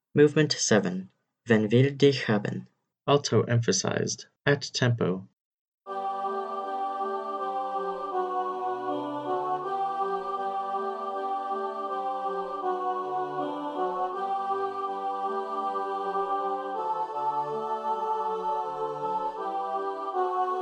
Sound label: alto emphasized at tempo